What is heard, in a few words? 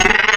Goat, Wavetables; Cymatics; Serum; Animals for Serum - Gold Edition